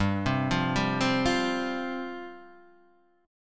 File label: C Suspended 4th G